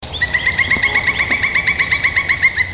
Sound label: pileated woodpecker call